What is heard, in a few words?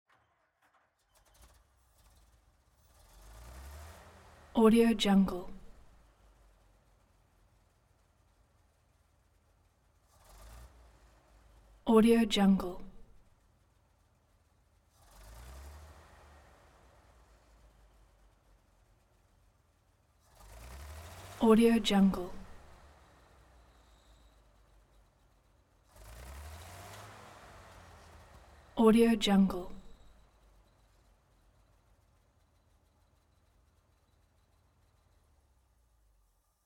vintage truck engine revving; moonaudioproduction